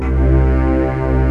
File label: SYN BRS & CHOR